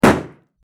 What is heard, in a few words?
car To Car Crash; sfx; teststrecke game